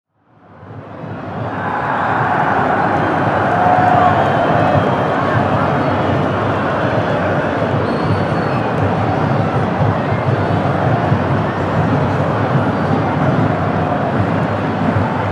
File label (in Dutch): voetbal publiek